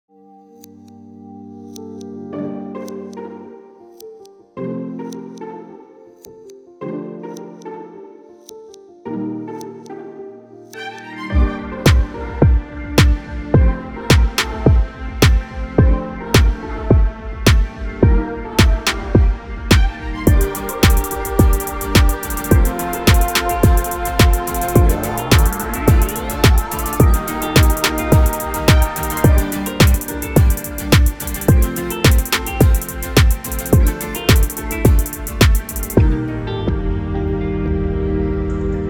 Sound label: Jingles intros; Mitsubishi